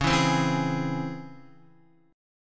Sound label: Db Minor Major 7th Db down Guitar; DbmM7 strummed; JGuitar